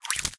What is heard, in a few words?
SFX Fight Card Get; mighty party 2017 gamerip pc ios android